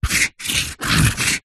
office marker pen highlighter luminous draw triangle on cardboard